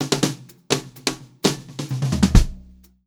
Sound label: Pop-Rock Drumloops